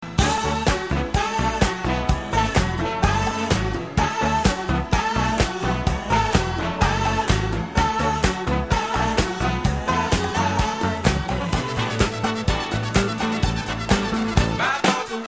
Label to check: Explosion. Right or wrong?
Wrong